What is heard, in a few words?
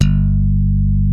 DYNA MID G2, DIGITAL BASS; Music Store - Claudius Diemeter Schwerlos